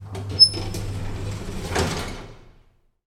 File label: industrial machinery elevator door open; sound effects one